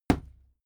fight impact sweetener cloth; nahkampf; soundpool

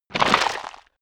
stone gravel clatter

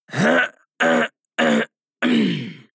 harrumph male hard long; koerpergeraeusche; menschen; soundpool

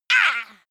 female attack

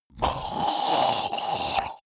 monster choking zombie dying; koerpergeraeusche; comedy-cartoon; soundpool

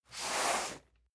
cardboard box medium slide; objekte

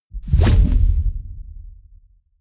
sci-fi plasma gun fire fling; pistolen gewehre

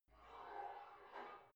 barbell heavy plate pull out slow reverby; sport freizeit; fitnessstudio

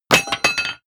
drop metal stone; hits explosionen; metall

